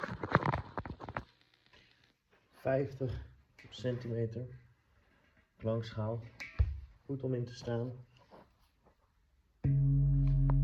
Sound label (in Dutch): 50CM schaal, klankschaal